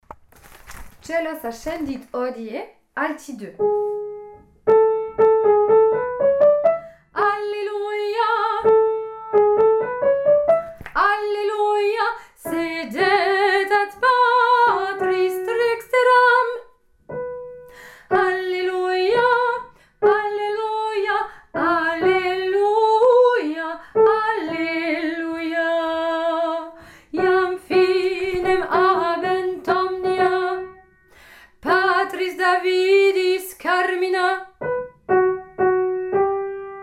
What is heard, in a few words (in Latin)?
Coelos ascendit hodie alti